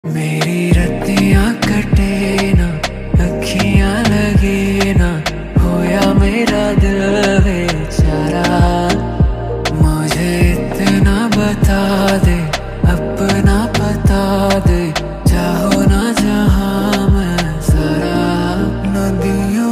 nadiyon sa ringtone song romantic heart touching song ringtone